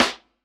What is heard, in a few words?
hiphop snare, Effect on